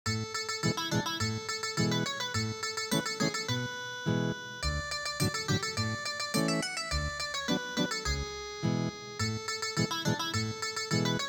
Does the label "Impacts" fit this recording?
No